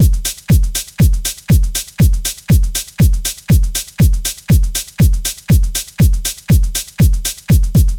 Beat 120BPM Low Deep

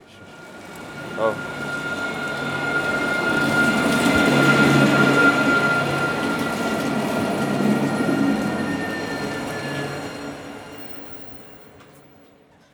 foley train passby

